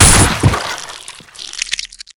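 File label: guardian beetle death, monster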